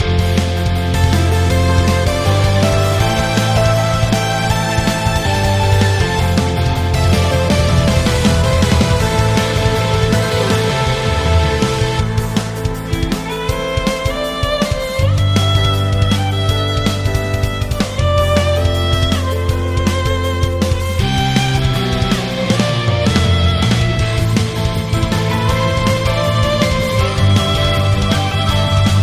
RPG Battle

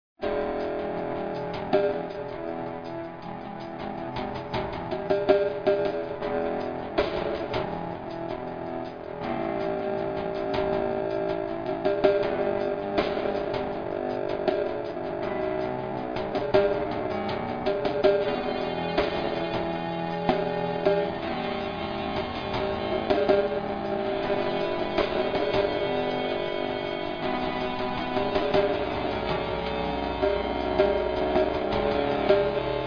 battle

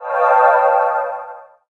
levelup

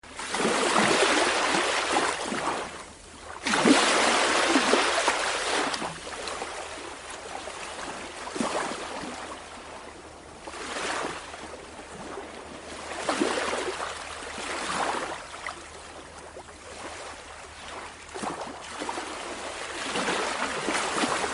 ocean wave, White Noise

